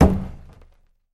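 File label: barrel sounds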